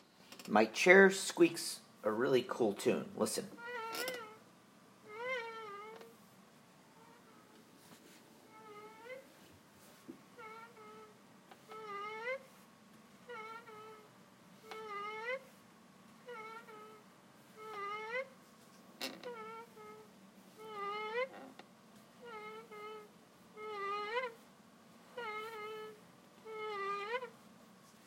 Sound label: Chair squeaking